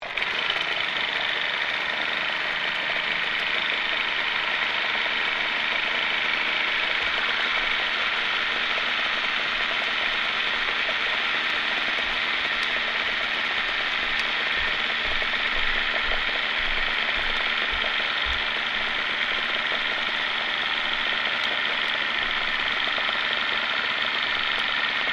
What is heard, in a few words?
Boat Motor Outboard Johnson 55 Idle Submerged; Various private boats; Vehicles